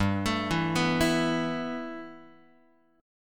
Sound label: G 6th Add 9th G, Guitar; G6add9 arpeggiated